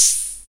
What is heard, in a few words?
open hat one shot d key, Royality free open high-hat sample tuned to the D note